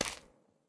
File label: footsteps moss; Fate The Cursed King